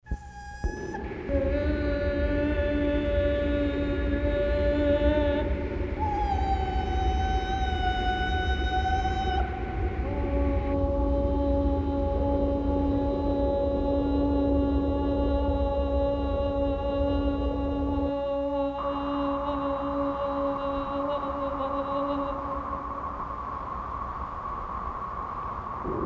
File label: singing noise colors